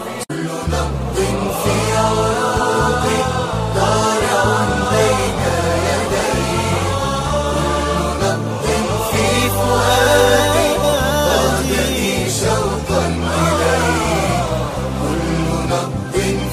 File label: Beautiful Islamic Ringtone